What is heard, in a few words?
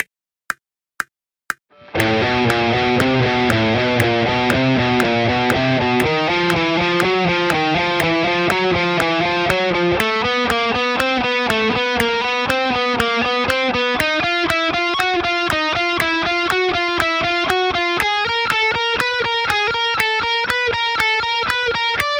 chromatic traning